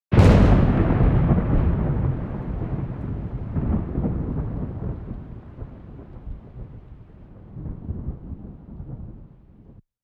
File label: thunder; dark